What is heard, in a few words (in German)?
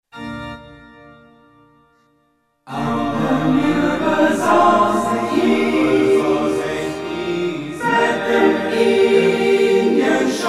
Chor